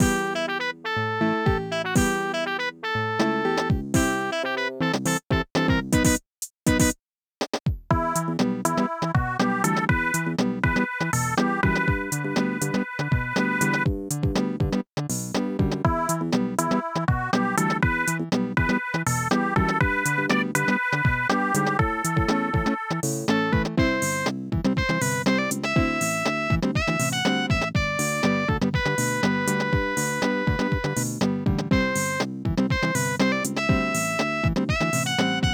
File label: Aircheck, Retro